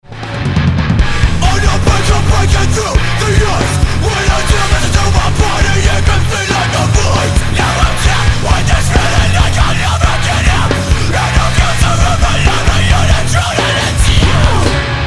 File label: METAL